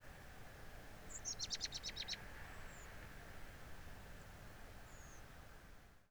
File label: Blackbird different type